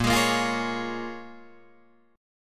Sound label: A sharp Minor 7th, down, Guitar, Drop D